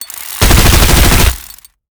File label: tank nailgun fire seq; Quake 4 Alpha; id software